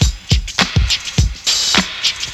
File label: TAPE LOOP, BREAKBEATS; Crypton - DrumBase5000; BREAKBEATS#4